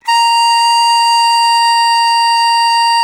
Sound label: World Winds, Old World Instruments; E-MU Producer Series Vol. 7 – Old World Instruments; World Combos